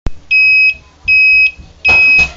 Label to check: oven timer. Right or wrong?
Right